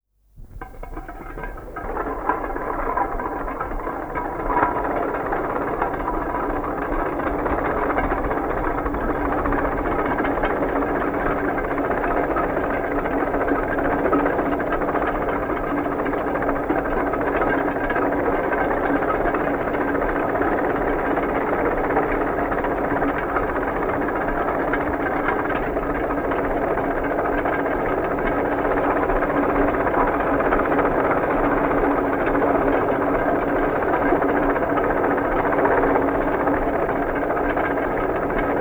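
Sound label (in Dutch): grasmaaimachine door paard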